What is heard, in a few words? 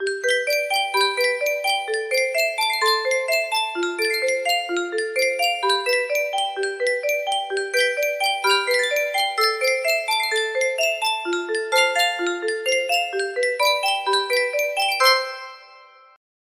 Yunsheng music box